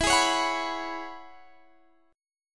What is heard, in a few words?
E 6th Add 9th E